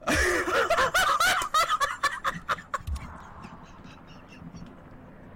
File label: laughing